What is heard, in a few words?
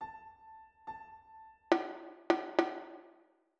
rhythm compound 2 beat